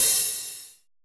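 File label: HARD open hi-hat; Drums In Your FACE!; R+B KITS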